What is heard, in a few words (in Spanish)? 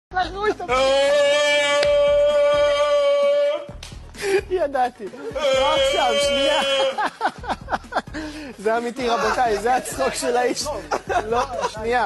jajaaaaaa